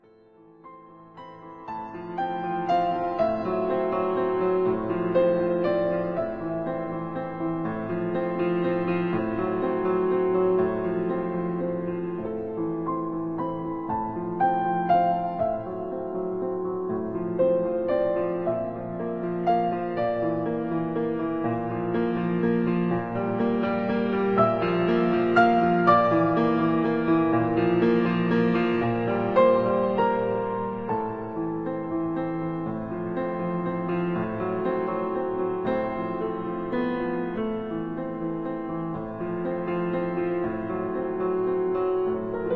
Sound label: piano; finalfantasy6; piano collections